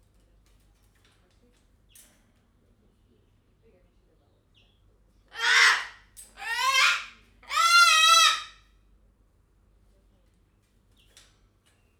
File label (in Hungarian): szüneteket beiktatva, fehérbóbitás kakadu; jaszberenyi zoo 2015